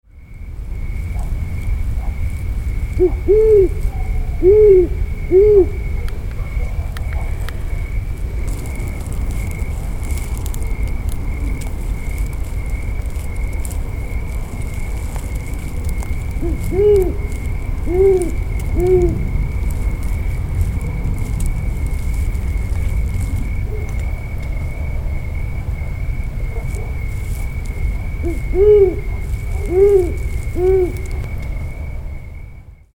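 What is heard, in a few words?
great horned owl, male; Cedarpines Park CA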